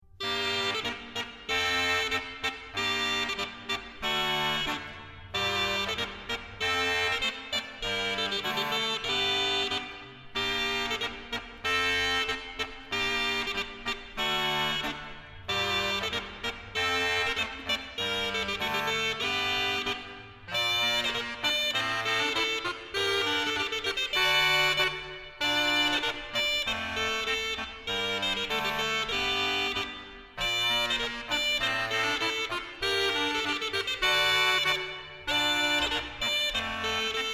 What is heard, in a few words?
crumhorn, branle; branle de la torche; praetorius 1612